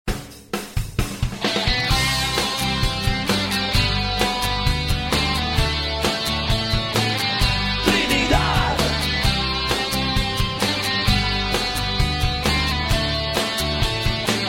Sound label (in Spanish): HIMNO VERSION CORTA